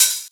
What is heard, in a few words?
Hats Electro Open